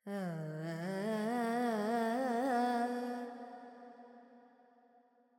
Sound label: FX Phrygian, Carnatic Female Alaps; Sample Banks